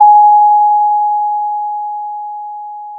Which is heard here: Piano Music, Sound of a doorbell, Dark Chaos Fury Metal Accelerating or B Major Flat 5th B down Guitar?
Sound of a doorbell